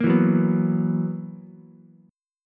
B Major 7th Suspended 4th B down Ukulele; BM7sus4; strummed

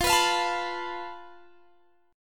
F Major Flat 5th Guitar Standard; strummed; JGuitar

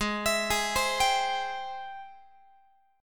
Ab Augmented Major 7th, Guitar; x,16,19,18,16,18; Ab+M7 arpeggiated

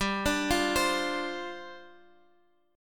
G 7th Suspended 4th G down Guitar Half Step Down

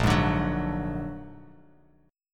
Bb Augmented 7th Bb down Bass Standard; Bb+7; strummed; JGuitar

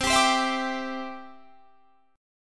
C Major Add 9th, Guitar, Standard; strummed; Cadd9 chord diagram.; JGuitar

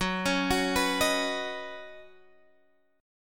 D sharp Minor 7th Sharp 5th F sharp

